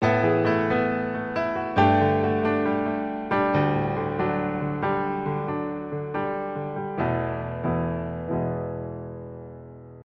Delicate Piano Etude

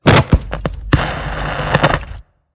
Transistor Glitch

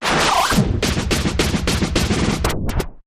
Big Interference Pounder

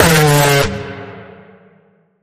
Bend The Alarm D

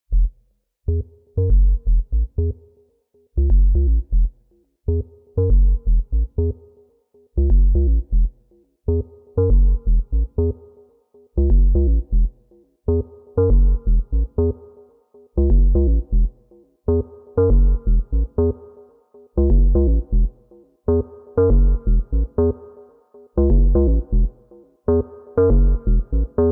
Underscore Bass

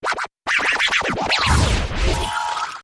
Hard Scrubs

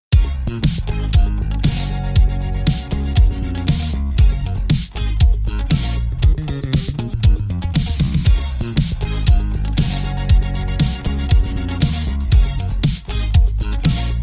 Robot Funk

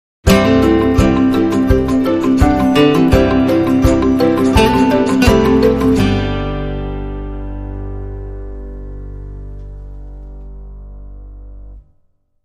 Sting

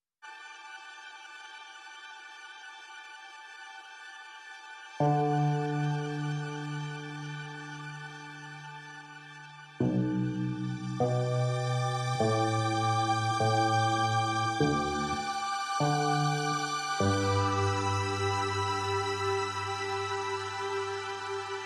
Reduced Piano Reduced Arrangement

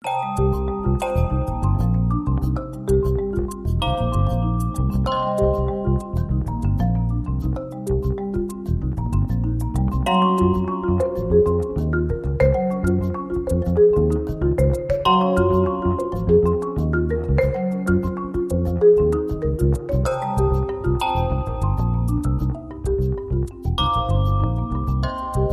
Marimba News

